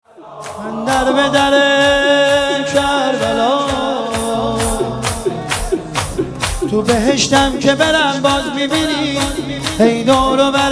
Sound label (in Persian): مداحی